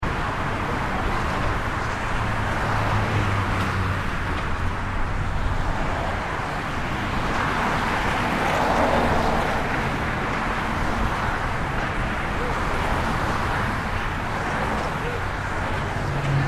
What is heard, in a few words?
Freeway Traffic Fast Long, Traffic noise from cars and trucks; passing by at high speeds on freeway